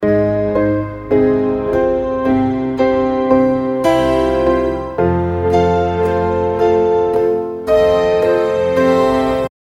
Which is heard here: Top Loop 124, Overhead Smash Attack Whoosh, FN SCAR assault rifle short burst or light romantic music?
light romantic music